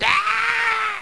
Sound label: fall; blockofighter